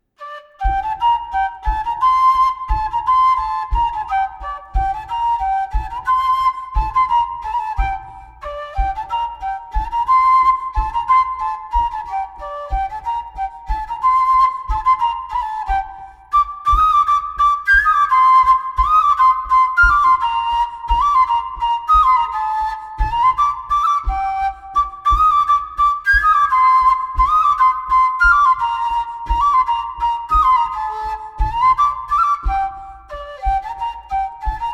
Bourree theme

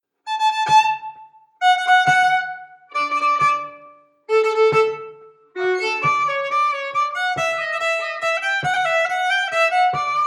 Valse

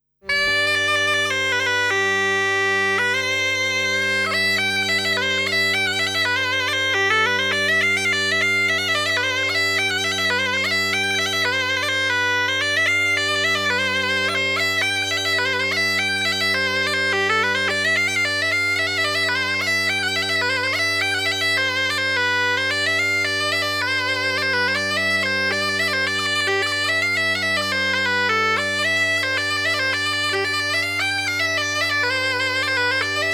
Marche a la chabrette

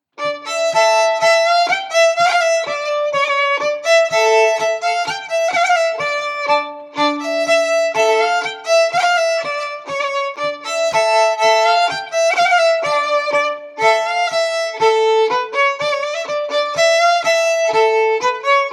Bourree

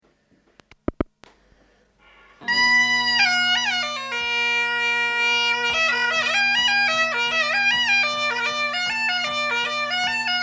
Mazurka; Mazurka du pere Tiro

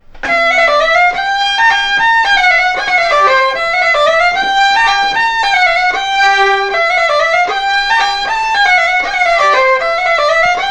Bourrée; Bourrée à Berthout

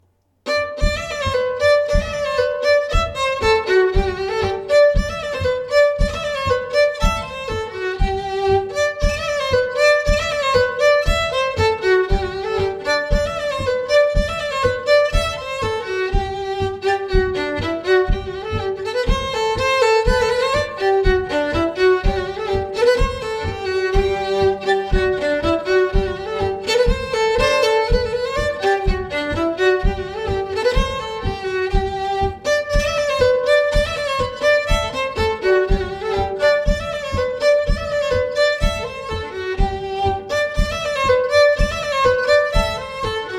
Bourree; Recueil Hainl; Bourree de Maringues

